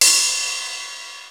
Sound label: TR, drums perc, Roland; Rubber Chicken Software Sample Bank